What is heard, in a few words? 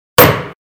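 sharp sound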